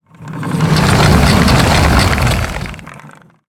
backdrop roll

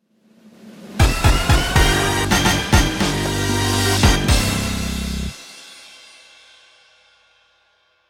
Quiz Complete!; paper mario the thousand year door

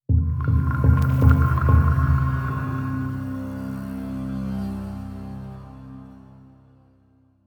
Start Sound; quern undying thoughts linux macos windows xbox one gamerip 2016; Seekers

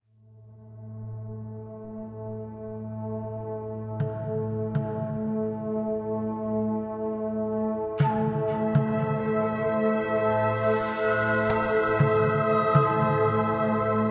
Music India Calm

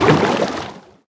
Movement swim Switch lane; talking tom gold run; Tomswim

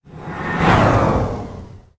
Alien fly away; blastmorph pinball; xbox series xs

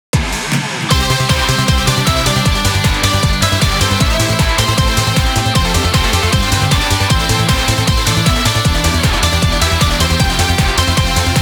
HAPPY TRAINER (Happy Instrumental); pachislot gi derby club 3

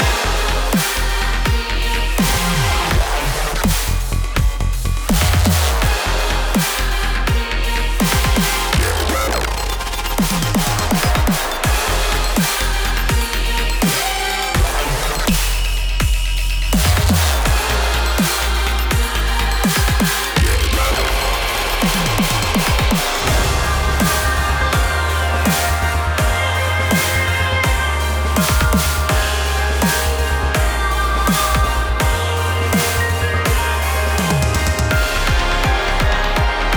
Boss Loop; Goddess of Victory Nikke

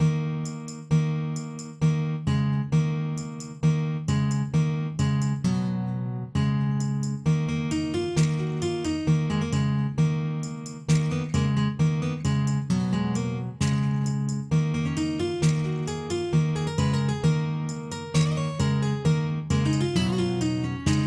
End Melody; Silversword

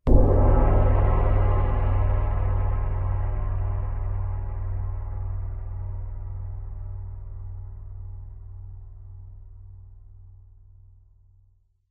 Gong Shot; levels addictive puzzle game

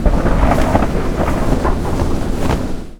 Snow Slide; Blastmorph Pinball; xbox series xs

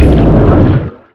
Movement swim Crash; talking tom gold run; Tomswim

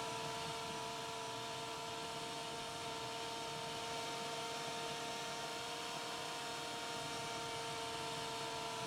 vehicle electric idle

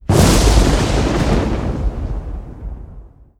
Lightning

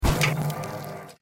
Spring step; The Joy of Creation Halloween

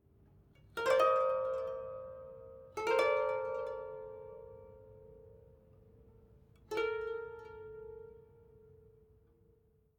Savannah Music

